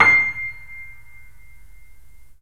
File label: C, 3-D Audio Collection, 3D Pianos, Yamaha C7